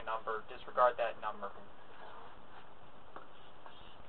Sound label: heard bell